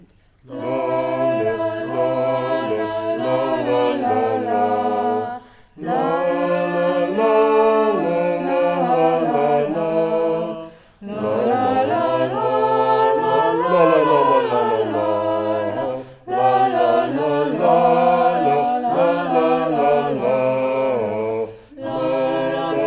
lalala; tumbalalaika

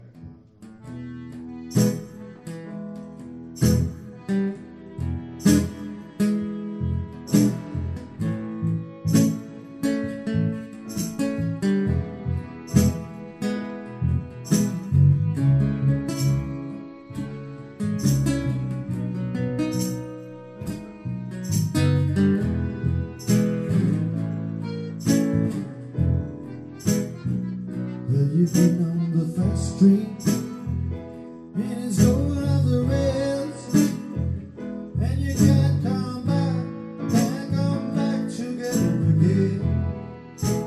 fast train

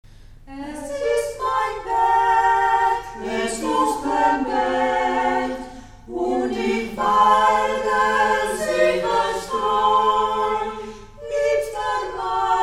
karaoke

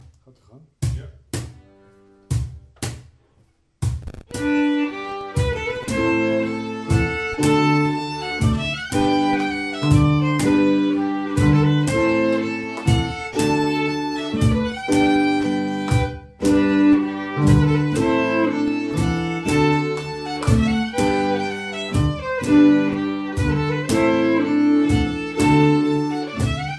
jamtpolska

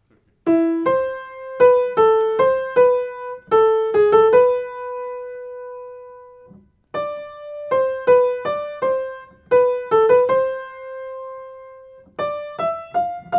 piano

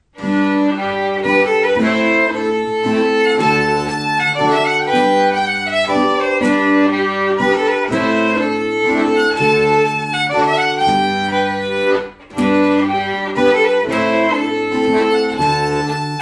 jamtpolska

